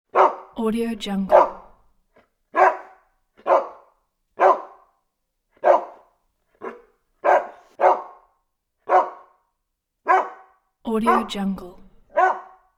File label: dog bark; leksab